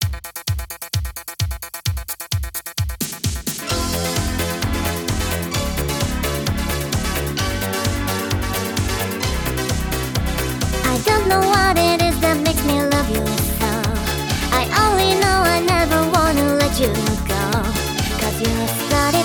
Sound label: Sung by 2 Female Singers (Synthesizer V Cover)